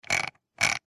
Computer mouse roller

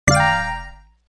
Game bonus reward

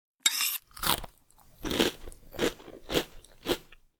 Breakfast cereal eating with a spoon

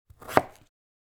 Wooden cutting board single chop

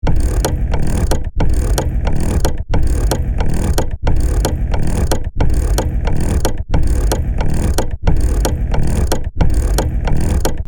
Heavy industrial machine running loop

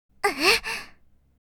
Effort grunt anime girl vocalization

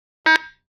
Walkie talkie turn on push button beep

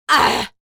Woman yell loud and aggressive

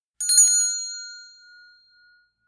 Convenience store opening with a bell ringing

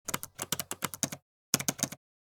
Laptop keyboard typing fast

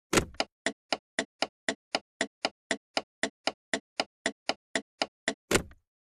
Car interior turn signal on and off lever

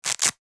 Velcro wallet open; purse

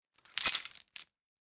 Small chains movement rattle

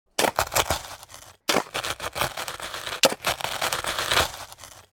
Shovel digging into dirt and leaves

